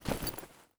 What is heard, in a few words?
gear fast stereo; Escape from Tarkov